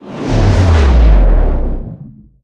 damage hits explosions; World Of Warships

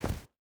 footstep barefoot snow jump land; Rust

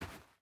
footstep hide sand walk; Rust

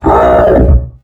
commando jump

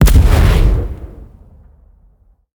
damage vehicle; World Of Warships

